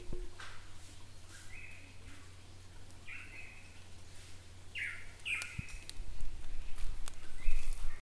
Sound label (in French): oiseaux